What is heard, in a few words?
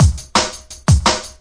170 bpm tekstep flat, drum loops and breaks